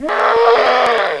Bull Die

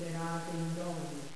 Ice Queen Attack

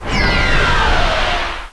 Hydra Attack

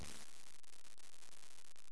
Yeti

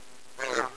Goblin Attack

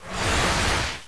Knight Skill

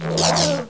Shadow Attack

